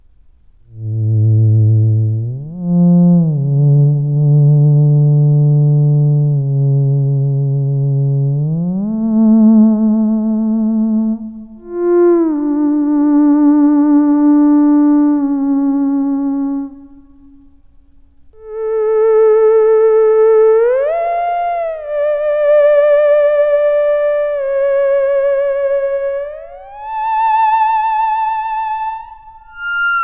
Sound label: weeping